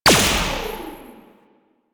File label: Laser Skin Printer Laser Start; Ambience; Props; Warframe; Entrati